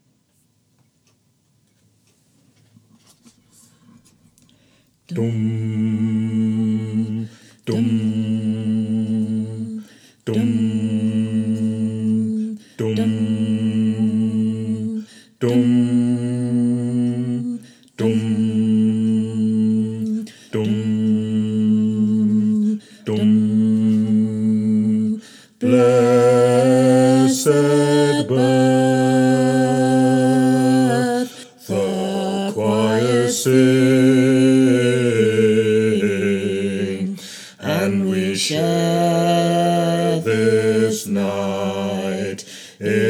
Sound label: V12 BASS